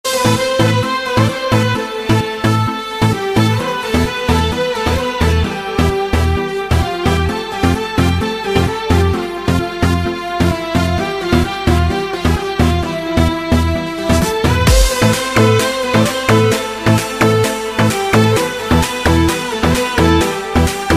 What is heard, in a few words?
Violin Mix